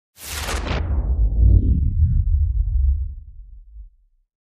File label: Descend Tickling